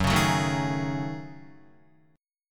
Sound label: Gb Minor 6th Add 9th Gb down Guitar; Gbm6add9; strummed